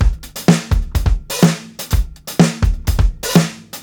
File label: 125 BROKEN 16THS, DRUMS LOOPS; BASEMENT DRUMS VOL 2